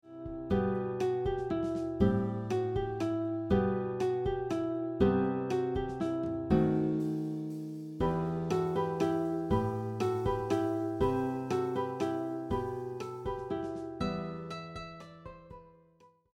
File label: guitar; Carol of the Bells; christmas songs